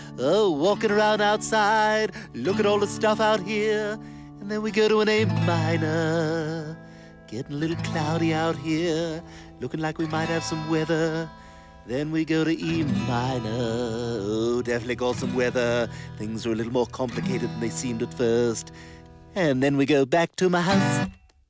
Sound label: Chord Change